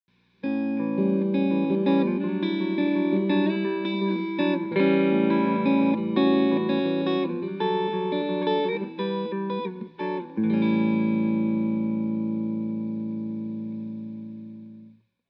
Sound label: Clean